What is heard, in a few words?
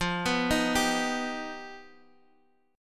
G Augmented 7th